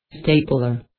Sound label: stapler